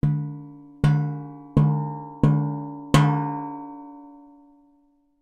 impact metal tray med object strike resonate x5 soft increasing in intensity